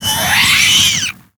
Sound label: Fiery Bat Attack